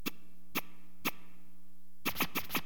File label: SCRTCH, Hip Hop, Fx; LiveAct Pool